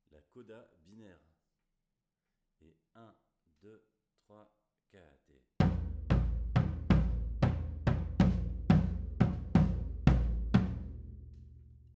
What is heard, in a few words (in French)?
Coda Binaire Bass, Batucada